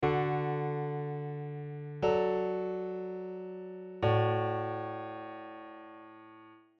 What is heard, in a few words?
Chord Inversions